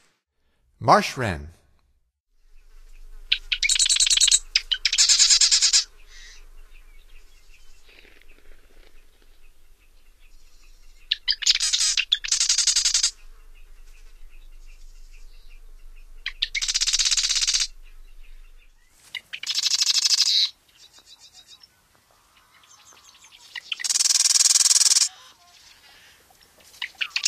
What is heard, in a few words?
Marsh Wren, Songs of Birds of North America; Stokes